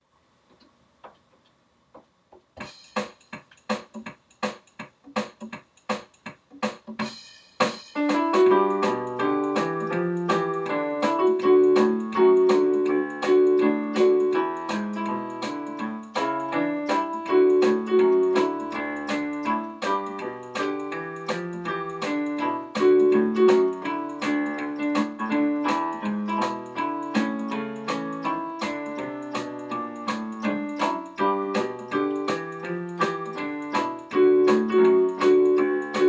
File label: this land rhythmic displacement key noise